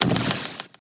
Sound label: candy shot; SFX down candyshot2